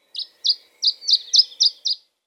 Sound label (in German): Kanarenzilpzalp Phylloscopus canariensis; Vogelstimmen